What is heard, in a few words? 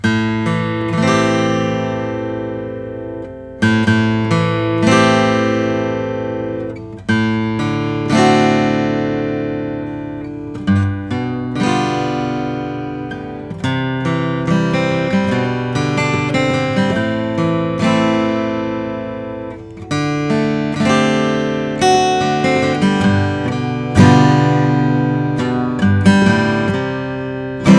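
custom om 41 adirondack natural, guitars; bourgeois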